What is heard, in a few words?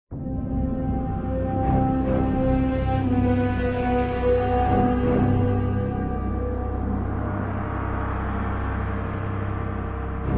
Borg Ambient